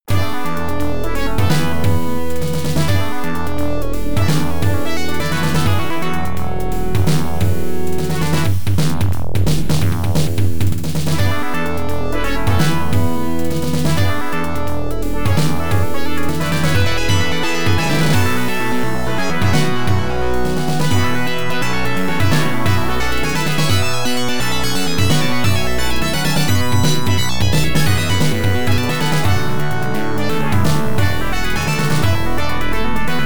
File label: Main Theme, pinball; Cannon 2 Loaded